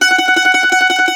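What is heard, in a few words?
MANDOLIN TREMOLO, Plucked Strings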